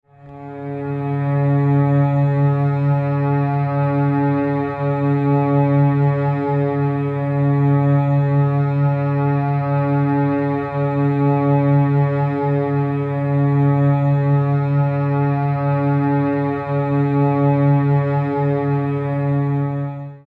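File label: Low C sharp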